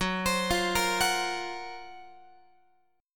F sharp Major Sharp 11th F sharp, Guitar; F#M#11 Chord; arpeggiated; x,14,15,15,14,17

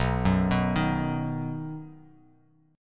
B Major, Bass Standard; arpeggiated; 7,9,9,8; JGuitar

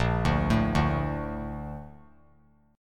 Bb Major 7th, chord; BbM7 arpeggiated; JGuitar

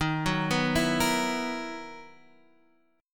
B Major 11th D sharp, guitar

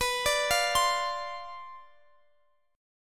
C Major 7th Suspended 2nd Suspended 4th B, chord

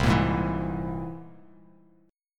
C Augmented 7th, Bass Standard, chord; strummed; JGuitar

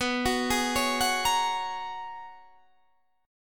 G sharp Minor 11th B, guitar chord

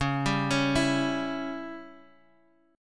Db Diminished, Guitar Half Step Down

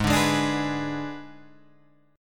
Ab Diminished, down, Guitar, Open G

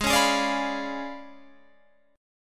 C sharp 7th Flat 9th, chord

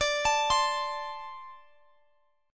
D 5th 7th D; D57 Chord {X X X 19 22 20} arpeggiated

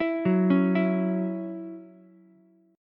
Db Minor Double Flat 5th Db Ukulele; arpeggiated; Dbmbb5; JGuitar

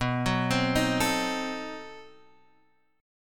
B 7th Flat 9th, down, Guitar, Open G

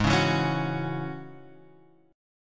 G sharp 7th Suspended 4th Sharp 5th G sharp; G#7sus4#5 Chord {4 4 2 X 2 2} strummed

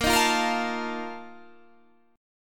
Bb Major 7th Suspended 4th, Guitar, Standard; strummed; JGuitar

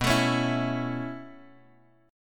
B 9th Suspended 4th B down Bass Standard; B9sus4; strummed; JGuitar

